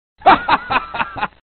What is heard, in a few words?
funny man laugh message tone